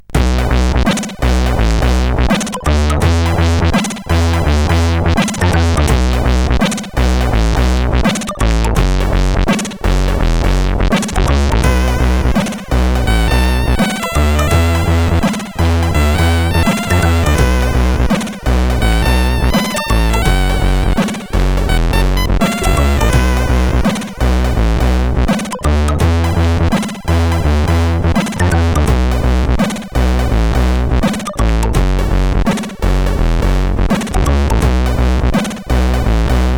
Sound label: Straaaange, CSG8580R5